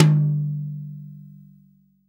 TOM, REAL DRUMS, SWING KIT

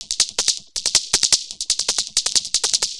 Vocobeat; Sonic Foundry (Sony Creative Software)

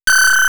high sounds

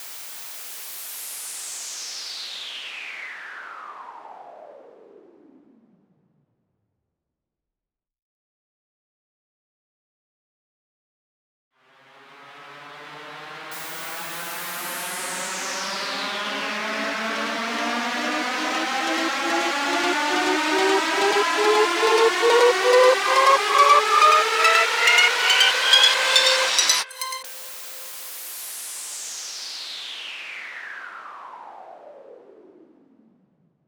fx; 140 BPM; Vengeance Essential Clubsounds Vol.5; VEC5 Demo Constr Kits; Vengeance Intro Kit; Root E

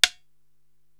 Guiro Tap

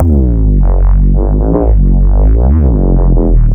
fat neuro, bass

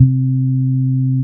Single Acoustic Basses; Best Service; Dance Floor Bass

